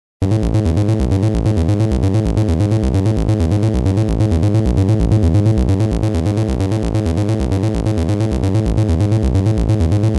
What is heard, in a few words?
analog synthesizers sequencers; dr zee workshop